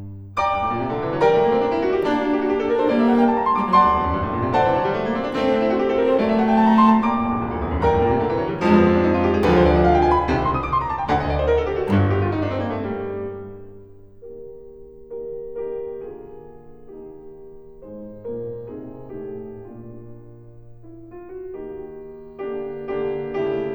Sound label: Variations for cello & piano in G major